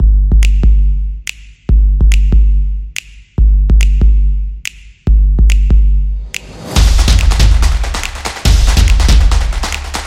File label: Stomp Clap Slap; samba